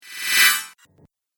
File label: Casting Spell, Magic Sound Effects